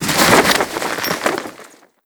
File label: luggage burst clothes, Explosions; Call of Duty Modern Warfare